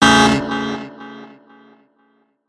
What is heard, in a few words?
notify